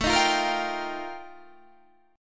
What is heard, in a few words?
F 11th A, chord